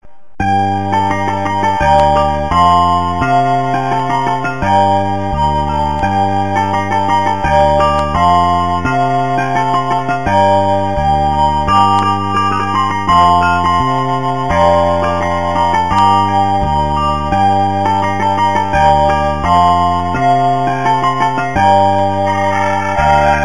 classical hindi

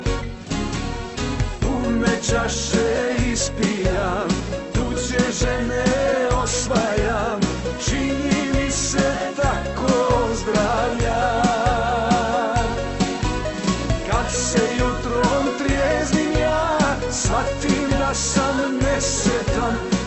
Beat Matic

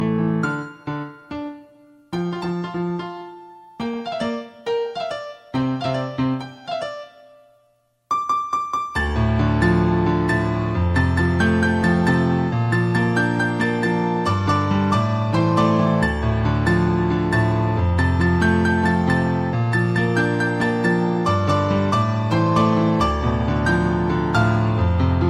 faint piano

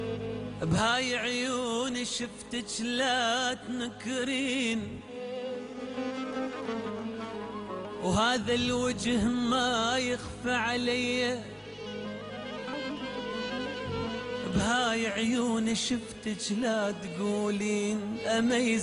Arabic Trap

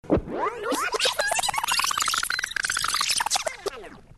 rewind; ringtones

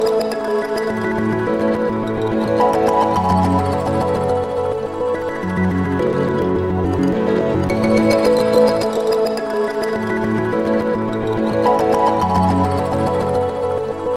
Piano and Xylophone

